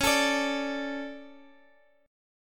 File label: D 5th C sharp; D5/C# strummed; JGuitar